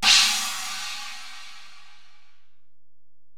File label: Ride, Alesis DM-PRO, Drum Machines